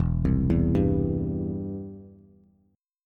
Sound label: E Minor Sharp 5th E down Bass; Em#5 arpeggiated; JGuitar